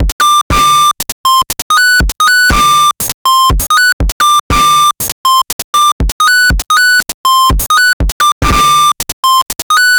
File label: clean modern intro music, intro